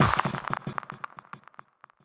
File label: confetti; patatap